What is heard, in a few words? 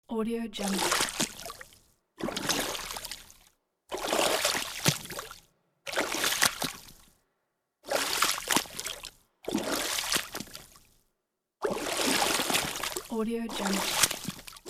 footsteps walking in water